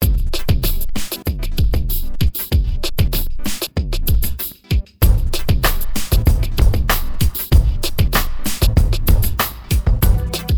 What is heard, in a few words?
Beat